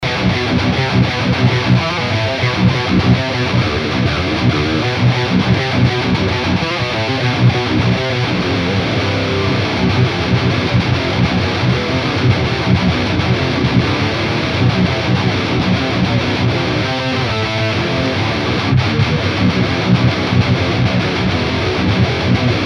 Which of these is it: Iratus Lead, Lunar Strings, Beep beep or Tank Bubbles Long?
Iratus Lead